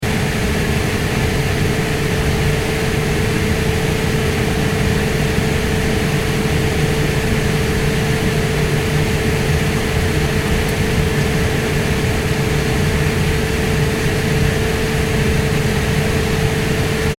transportation aircraft commercial airbus a340 interior landed taxi; Aircraft Taxi To Terminal Interior Ambience - Airbus A340 Commercial Jet; Airplanes; Vehicles; Aircraft (Airbus A340) Internal Taxiing Ambience